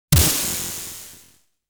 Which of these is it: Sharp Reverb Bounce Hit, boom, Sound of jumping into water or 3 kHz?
Sound of jumping into water